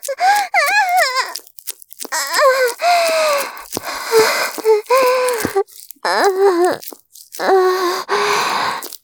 絶頂, ASMR; 騎乗位

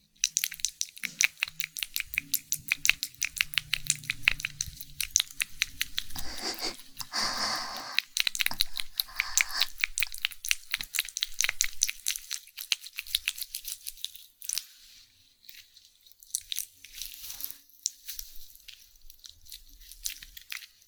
寝くちゅ イキ我慢

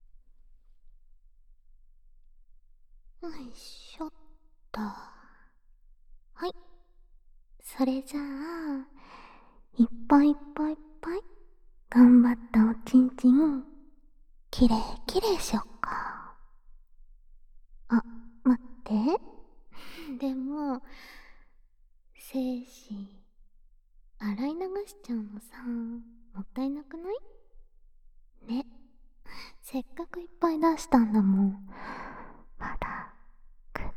お風呂加工アリ 一緒にお風呂, ASMR

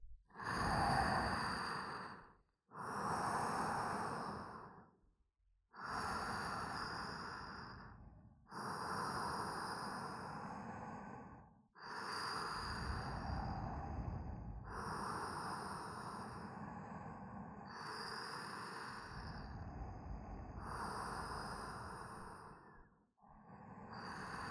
寝息トラック寝言カット版; 悪ガキの天使と悪魔ごっこ

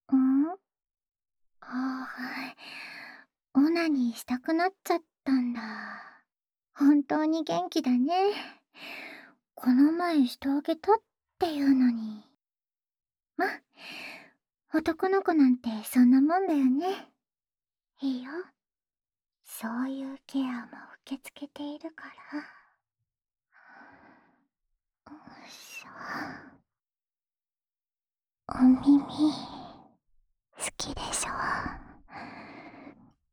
唾液たっぷりの耳舐め 右; オナサポ用; 青春べろちゅー委員会

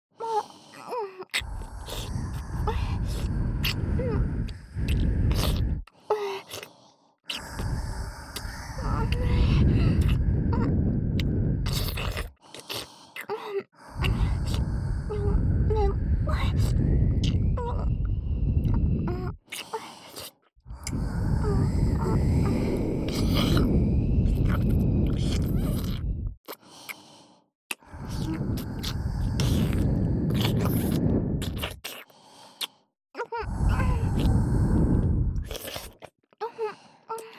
ディープキスしながら 【左耳ふーふー】; オナサポおまけトラック; 【不純愛】実の娘に脅されて子作りエッチしてます; KU100

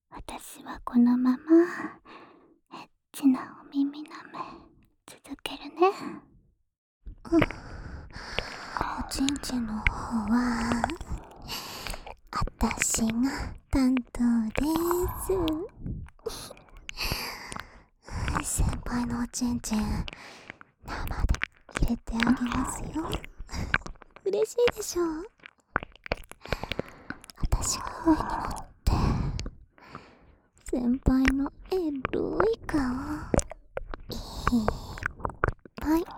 深い耳舐め（右耳）をされながら、ベロチューエッチ, ASMR; 究極耳舐め; プレミアムフォーリー

